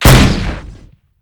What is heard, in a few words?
warhead launch; minecraft